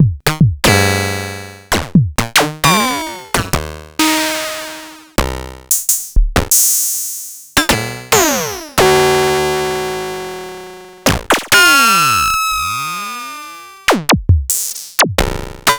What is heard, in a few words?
Korg Monopoly, Samples Sliced; m8